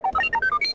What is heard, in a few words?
Droid Beep